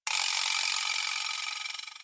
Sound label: chain; tenaPuyo